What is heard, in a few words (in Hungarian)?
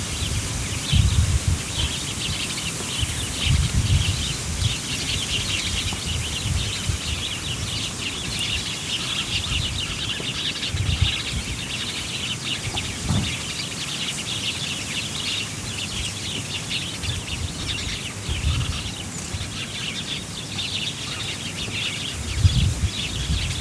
szélfúttan adás verebekkel hortobágy, házi veréb; termeszetben